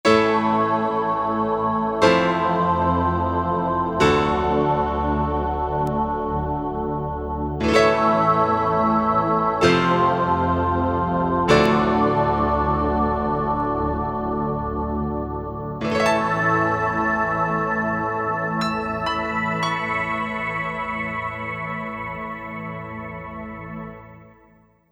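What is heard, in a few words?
Piano N Choir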